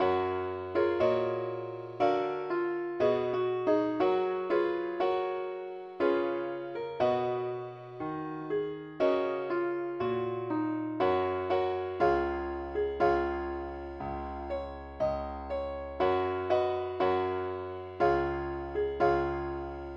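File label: ukelele key f sharp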